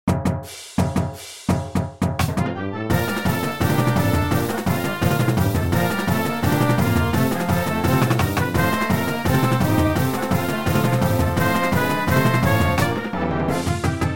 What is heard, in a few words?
Fight song full percussion